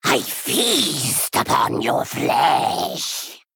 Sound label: Male Bat Play